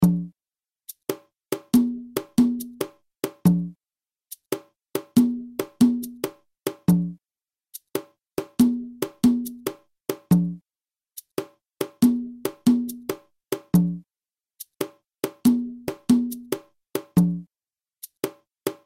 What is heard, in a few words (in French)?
guarapachangueo cajon lent